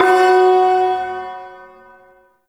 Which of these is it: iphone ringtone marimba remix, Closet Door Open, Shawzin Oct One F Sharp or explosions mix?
Shawzin Oct One F Sharp